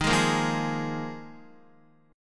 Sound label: Eb Suspended 2nd Suspended 4th, Guitar; Ebsus2sus4 strummed; JGuitar